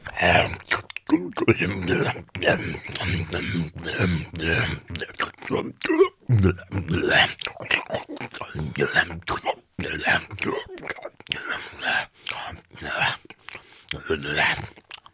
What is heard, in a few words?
Messy Caveman Eating Slowly